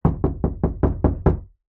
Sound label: household knocking door wood